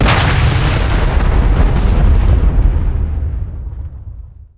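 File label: bomb explosion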